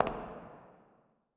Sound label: Foot Step; blue archive